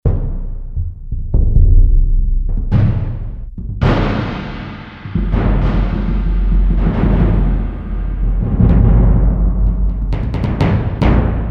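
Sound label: Orchestral Bass Drum; Roland L-CDX-04 Strings And Orchestral Percussion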